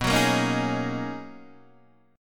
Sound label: B Major 13th B down Guitar DADGAD